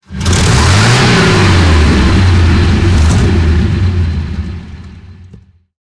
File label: panzer6 engine load, tanks; War Thunder